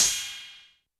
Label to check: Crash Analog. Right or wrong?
Right